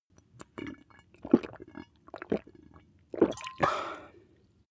glass bottle drink swallow sequence

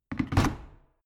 plastic water boiler place

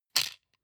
plastic frame small hit rattle

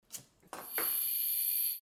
hammering

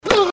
football tackling

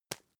tissue tap

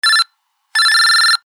toy phone

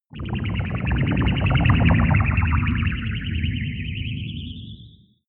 whoosh storm twitter long

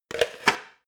plastic cup stack

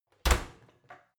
oven close baking sheet ringing

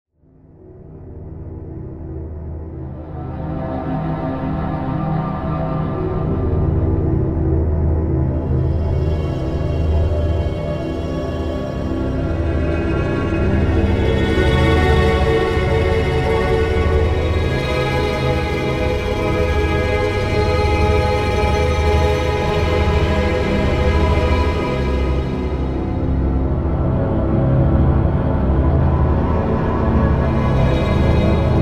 surreal melody drone

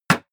block wood

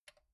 metal button stereo spring release

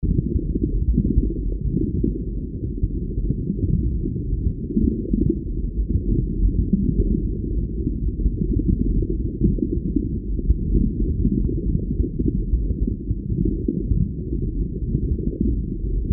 hollow bubbling

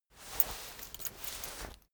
key ring mid size pocket fetch

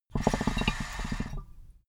water pipe puff bubble short

